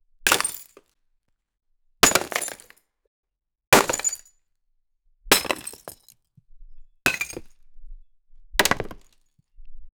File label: sound of wooden splinters, break